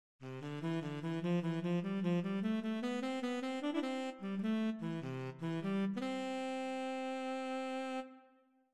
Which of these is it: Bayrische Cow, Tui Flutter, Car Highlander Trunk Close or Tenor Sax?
Tenor Sax